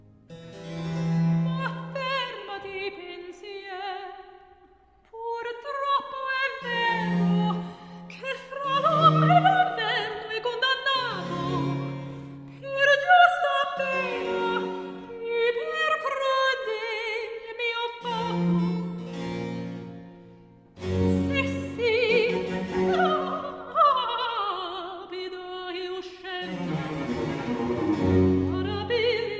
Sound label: Cantata Recitativo; Il Delirio Amoroso; Handel